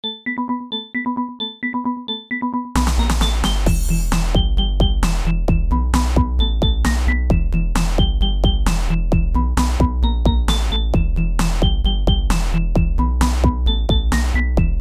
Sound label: techno; Tetris; tf2